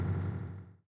stop, vehicles

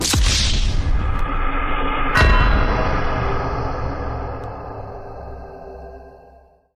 death sound; gmod

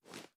reload empty arm; reloads; gmod

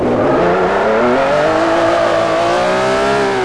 first, vehicles; gmod; tdmcars; enzo

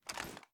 raise first start; gmod

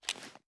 inspect

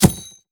frag physics bounce grass hard; gmod; viper

